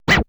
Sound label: drum, 80s Drum Set, DRUMCOMPUTER; Best Service ProSamples vol.55 - Retro Sampler